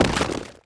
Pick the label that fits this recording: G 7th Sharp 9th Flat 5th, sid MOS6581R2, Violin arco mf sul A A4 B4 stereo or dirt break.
dirt break